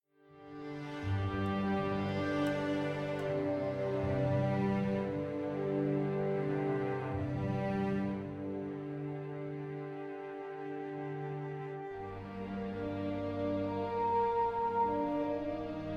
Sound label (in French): basson; infinity crisis